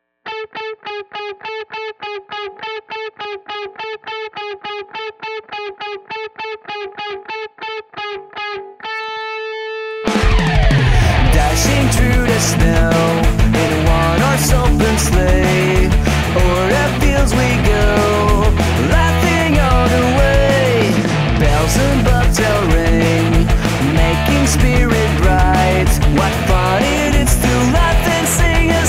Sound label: Jingle Bells (Rock Version)